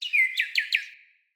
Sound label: Cardinal Female Bird Song